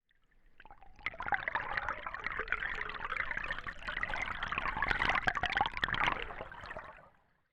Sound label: Running Water Hydrophone